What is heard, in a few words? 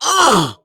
Pain